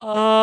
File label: alto saxophone ah melody morph low